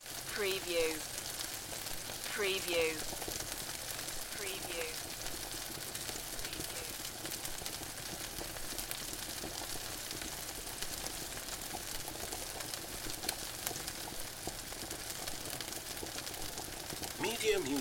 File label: Frying And Boiling